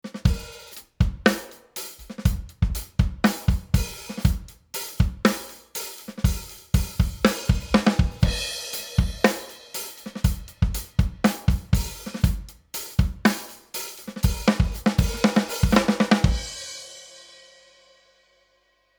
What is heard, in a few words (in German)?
Compressor Drums Ratio 4 zu 1; SSL G Series